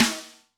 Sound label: Snr, drum